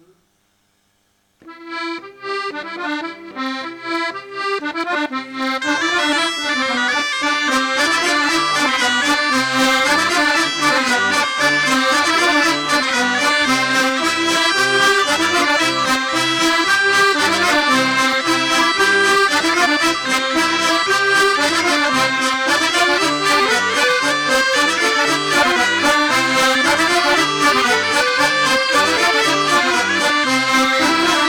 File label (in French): Polka Piquee